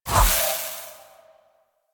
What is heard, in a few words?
Wisp Switch Corrosive; Warframe